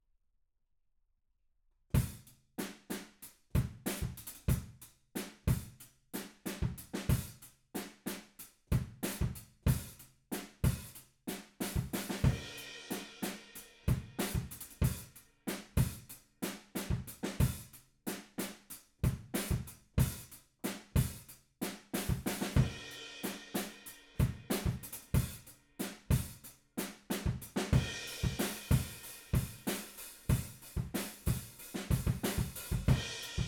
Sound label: Room